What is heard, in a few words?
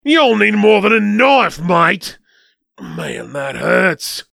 Stabbed